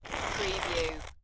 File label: Stone Grind - Scrape Along Stone Pillar - Short